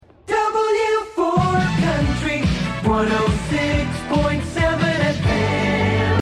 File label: FM Country Early 90s Jingle; WWWW-FM; W4